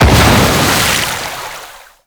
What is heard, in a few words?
Water Tomb Explosion; Yareli